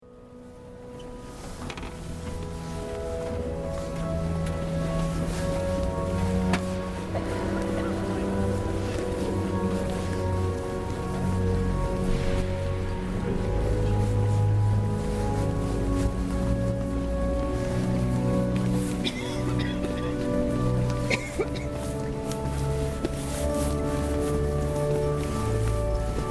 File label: organ; StPauls